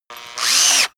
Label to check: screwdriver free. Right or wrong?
Right